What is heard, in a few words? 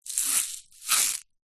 salt rock in plastic shaker tip movement; zapsplat